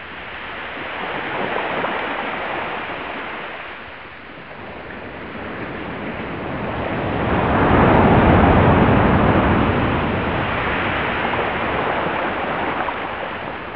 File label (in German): meer rauschen stark